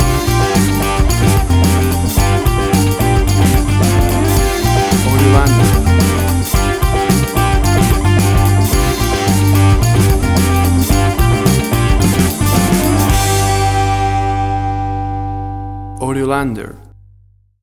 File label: Bluesy Funk Riffing